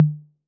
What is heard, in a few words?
Tom Mid A 808, Clean Digital